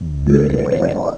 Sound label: DROWN